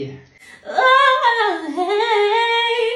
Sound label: aaaaahaaaaaah